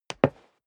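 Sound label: step dress boots wood parquet single stair down, footsteps; schritte; menschen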